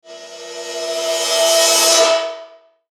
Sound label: Metallic Ascend Dark Reverb